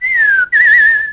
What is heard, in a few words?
ROBIN; animal fun